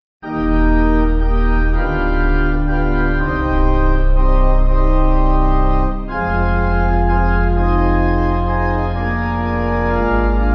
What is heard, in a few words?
My New Life I Owe, Pipe; Organ; San Gabriel